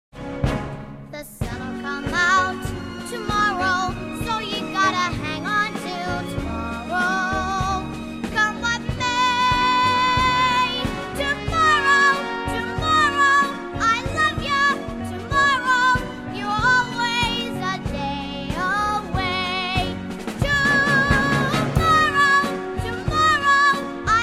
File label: Vocal Guide